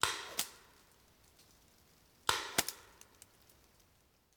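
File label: Paintball Gun Impact; Sound Ideas; Guns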